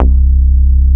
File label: Bass Note C